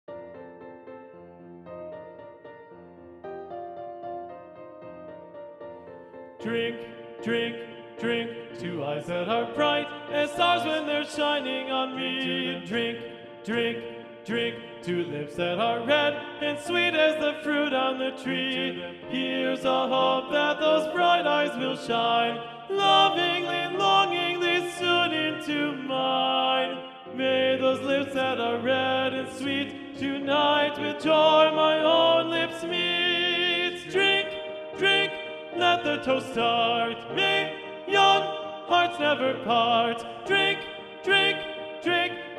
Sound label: Drinking Song TTBB - Tenor 1 Predominant